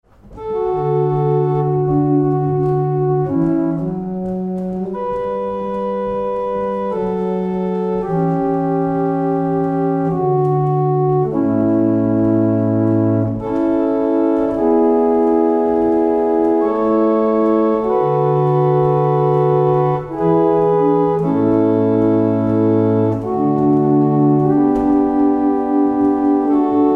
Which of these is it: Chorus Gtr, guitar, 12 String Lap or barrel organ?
barrel organ